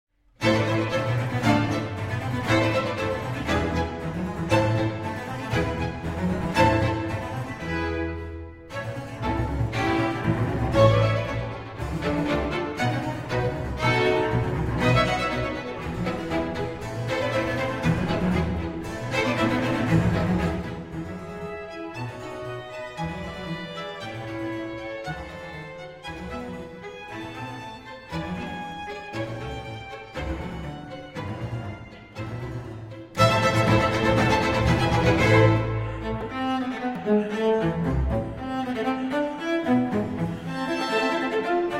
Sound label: Cello Concerto in A Minor